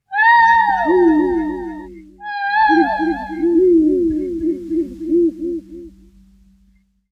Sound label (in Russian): krik sovi